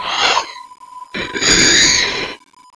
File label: child alert